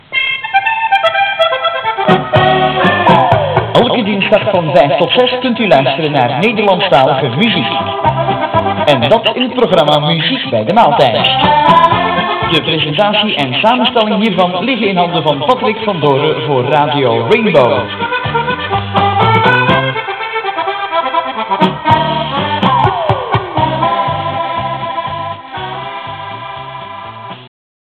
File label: Rainbow jingle